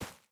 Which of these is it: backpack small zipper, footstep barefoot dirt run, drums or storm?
footstep barefoot dirt run